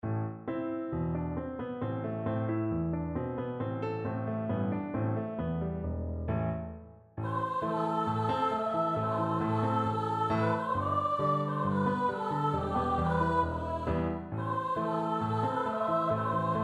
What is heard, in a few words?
Flitter Dance song; MANX FOLK AWARDS